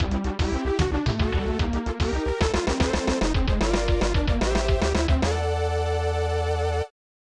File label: Victory Fanfare; brain lord; snes